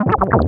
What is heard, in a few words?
Game, 游戏音效; 特酷声音素材库